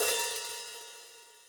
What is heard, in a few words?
hi-hat, Millenium MPS-400